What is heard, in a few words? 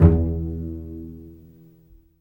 Vcs Pz, String Sections; Roland LCDP13